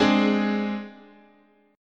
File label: G sharp Major Flat 5th, chord